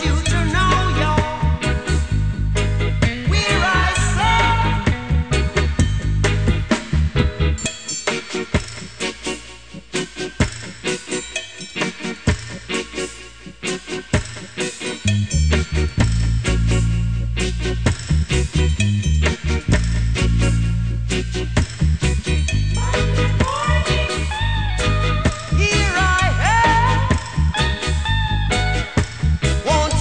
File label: reggae